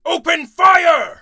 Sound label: monster; darkwing